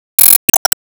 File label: Se Enemy Teapot Teacup Summon Motion